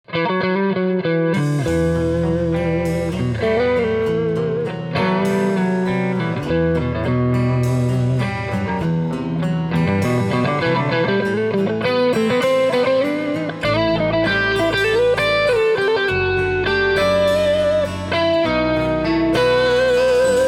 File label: Gibson LP Special P90s; 1998